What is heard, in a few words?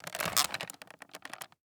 Technology Electronic Portable DVD Player Opening